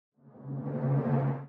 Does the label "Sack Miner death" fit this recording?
No